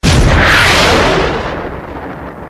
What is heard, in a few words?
panzershreck, Guns Fire; Weapons